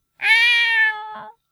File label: neko